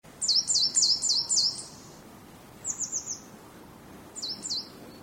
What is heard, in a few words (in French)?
mesange nonnette